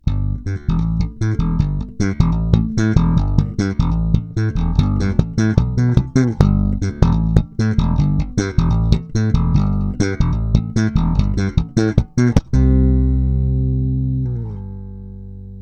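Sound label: Elixir, bass; La bella; Rotosound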